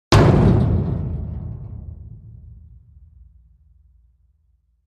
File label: Industrial Waste Removal Bin Hit with Sledge Hammer